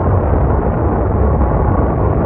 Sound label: Plane Jet Loop; SFX Kit; Airplanes